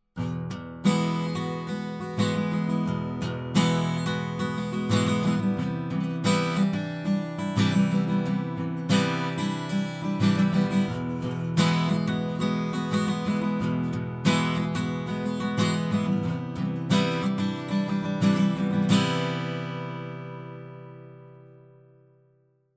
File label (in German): WA 47jr Git Kugel